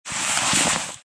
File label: hole open